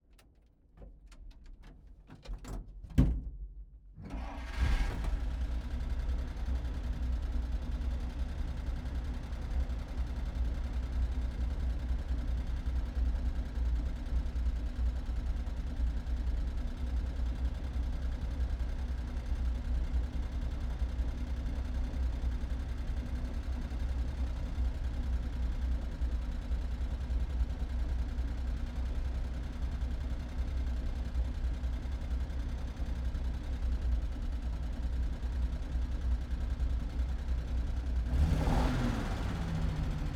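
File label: opel blitz onboard start idle steady blips off interior; DPA4021; 3600; 1939; Pole Position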